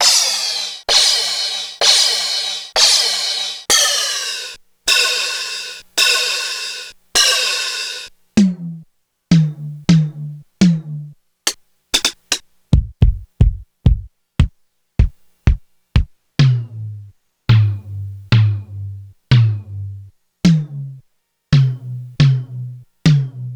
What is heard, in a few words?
Korg DSS-1